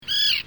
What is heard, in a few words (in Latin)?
sterna hirundo